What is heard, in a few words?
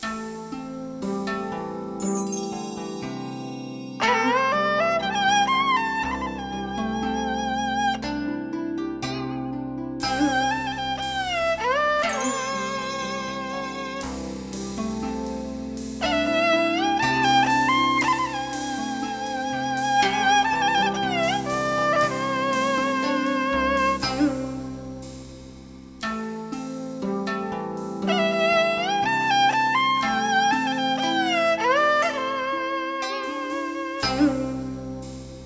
sacred chinese mountain loop; Oriental Empires